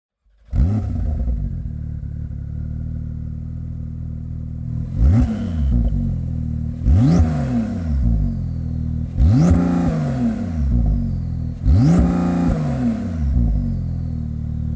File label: Slip On Race Line Link Pipe Set Porsche 911 Turbo 992, exhaust